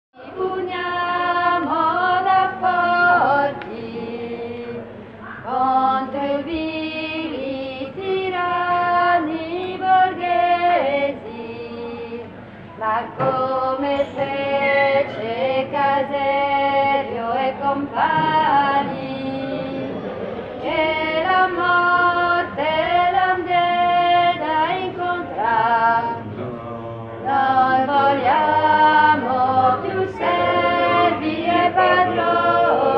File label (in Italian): tutti; Su fratelli